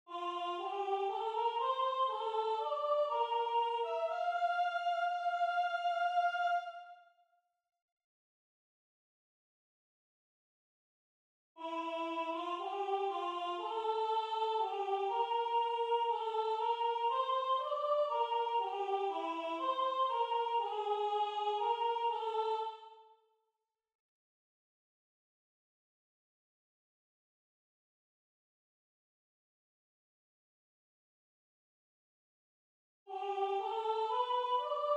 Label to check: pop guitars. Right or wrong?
Wrong